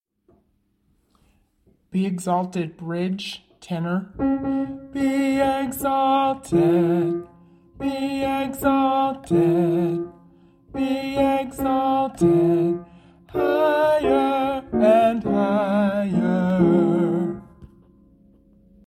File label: bridge tenor Db